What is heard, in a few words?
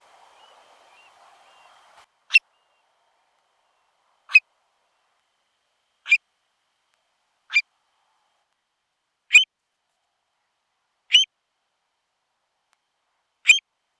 call, burhinus oedicnemus; Sennheiser M67 + Fostex FR2-LE; Dimitri Giunchi and Chiara Caccamo (Departement of Biology, Pisa University)